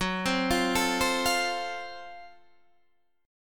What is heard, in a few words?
F sharp Minor Major 7th Flat 5th, Guitar, Standard; arpeggiated